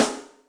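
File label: Open Rim C, Acoustic Snare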